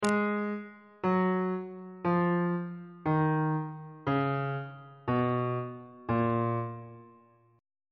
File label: a sharp phrygian mode descending